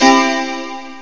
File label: frosted major